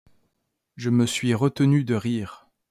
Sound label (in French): rire